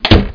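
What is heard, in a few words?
door close; Sound Library 2000; Wayzata Technology; Household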